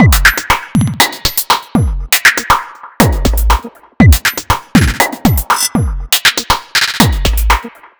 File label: Dub Beat, Drum Loop; Meshin Dub Beat; Sonic Foundry (Sony Creative Software); The Electronic Music Manuscript Collection; Drum Loop Structures